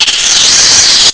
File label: bug hurt; Severance